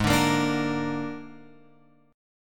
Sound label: G sharp Suspended 2nd Flat 5th, Guitar Open F; G#sus2b5; strummed; JGuitar